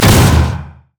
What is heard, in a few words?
impaling charge; LoL; hecarim